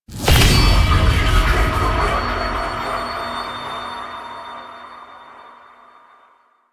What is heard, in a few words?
Telepathy Ringing; Cinematics; Shrine Intro; Ballas; Warframe; Sacrifice